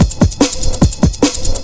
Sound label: SPEED, Big Beat Drumloops